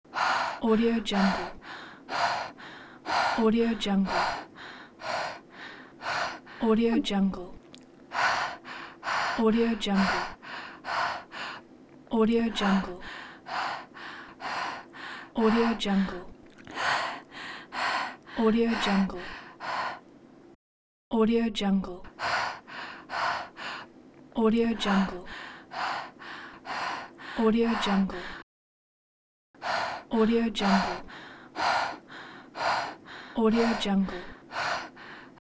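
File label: woman breathing fast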